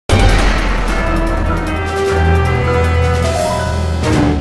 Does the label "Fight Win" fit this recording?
Yes